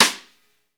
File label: SNR, Roland; Hybrid Kits; Club-50 - Foundations; Thin